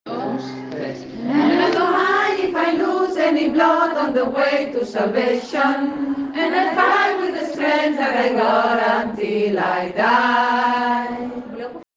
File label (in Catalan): Sopranos - compassos 44...48 (and I don´t mind); veu; De la pel·licula «Harriet»